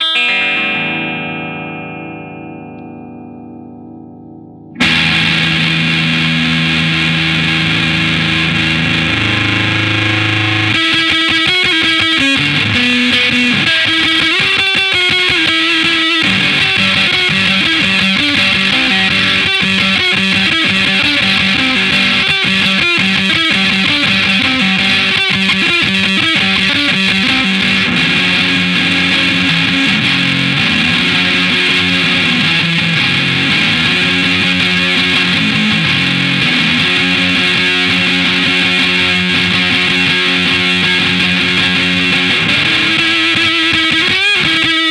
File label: bridge, SOLDANO; mr blender lp madness